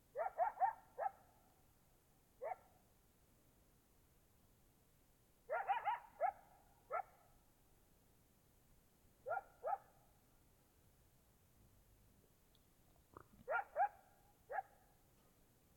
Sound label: Coyotes Close Coyote, Oregon; 2015; Sutton